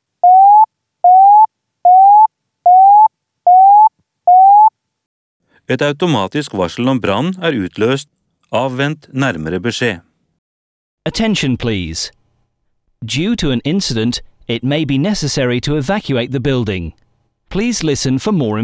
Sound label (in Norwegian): Liten alarm alert; talevarsling